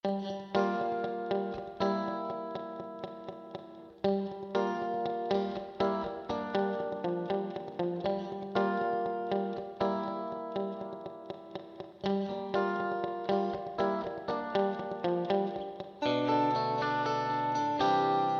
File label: Guitar Sony MU R201 Snare Vintage Digital